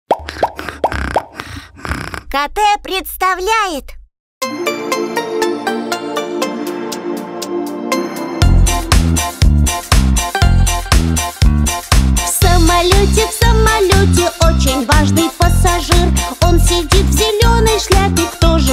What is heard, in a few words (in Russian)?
песенка для малышей; песенка игра про животных